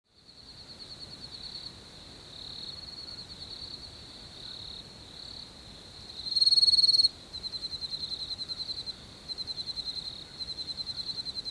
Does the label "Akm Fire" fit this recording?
No